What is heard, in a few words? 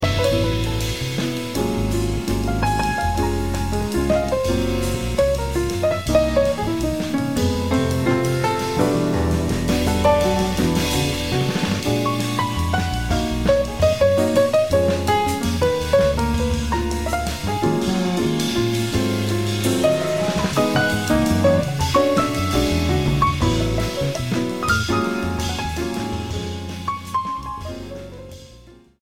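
piano solo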